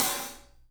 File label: Studio Hats, 3DPercussives